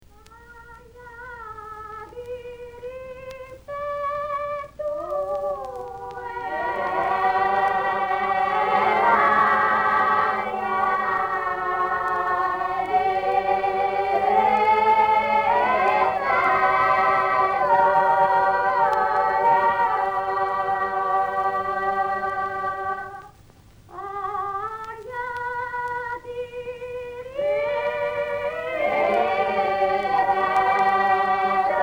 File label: a field song (aria diritta e ferro rovente)